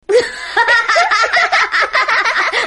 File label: kyahaha; pazu